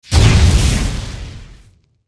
spell explode; archer

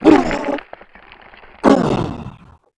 dead, skeleton king

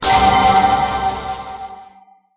spell iron heart; fighter

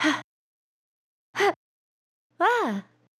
casting success, fishing; warrior

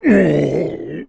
big die, voice

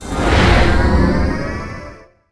spell holy down; magician

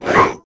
damege, monster orc lord

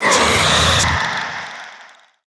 insect die, voice

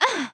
back damage, warrior

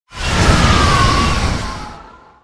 spell blizzard storm; archer

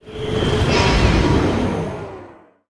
spell convergence; fighter

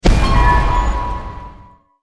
spell hiding; archer

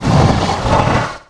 attack, monster spider king

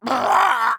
die, pirate; japanese pirate

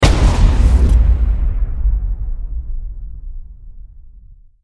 spell dark break; fighter

